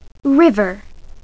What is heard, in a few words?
river